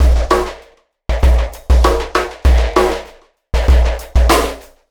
Beat, Processed Drumkits; Event Horizon

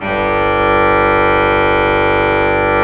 REEDS G1, ORGAN; AKAI S-Series CD-ROM Sound Library VOL-8

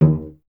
STR VC D#1, Cello; Miroslav Vitous; Solo Instruments VOL-1

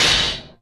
SHAT DOOR, Anime Sound FX; COMIC

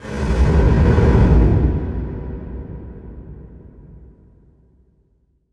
impact flame, Cinematic Impact; M-Audio Pro Sessions; Liquid Cinema

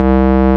ORG, Farfisa Clarinet

